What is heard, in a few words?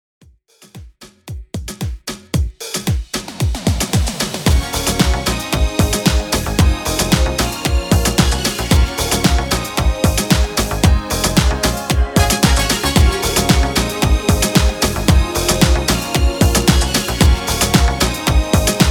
80S CLASSIC REDRUM 113 BPM